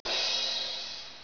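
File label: cymbals; 10,000 Graphics Pack Volume 1; Media Graphics International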